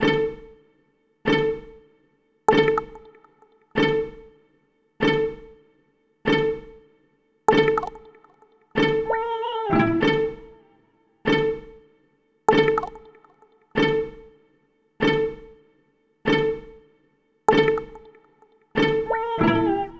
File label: Melody, Hip-Hop